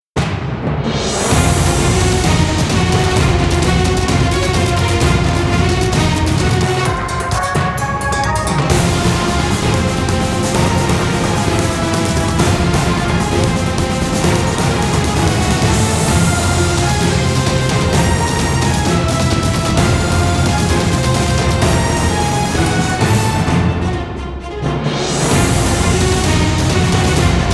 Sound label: Power Struggle -Superiority-; Caravan Stories Vol.7